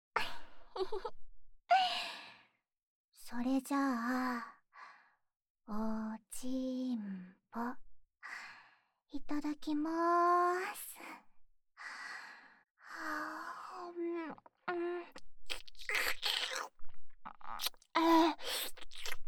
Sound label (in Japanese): 即フェラ ボイスオンリー; チンポ初めてむきむきしてもらいました